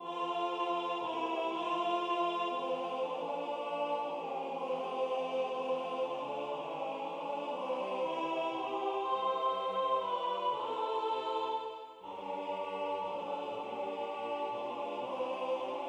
Lied an den Mai Alt; Lied an den Mai Version 1

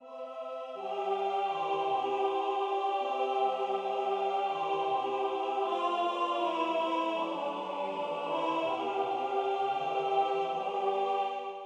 Agnus Dei Alt

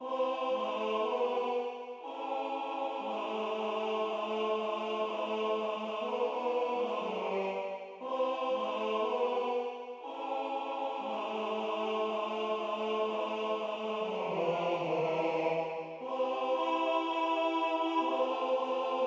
Samba bernoise Tenor

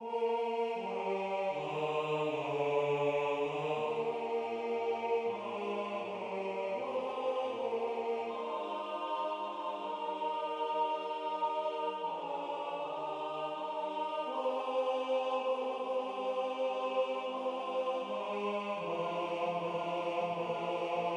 Tenor; Abschied vom Walde

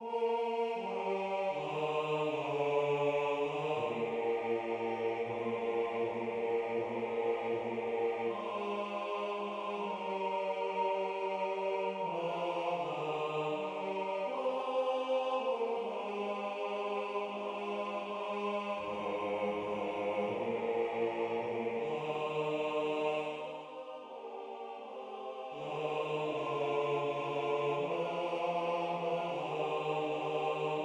bass; Abschied vom Walde

